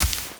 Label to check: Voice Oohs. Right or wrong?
Wrong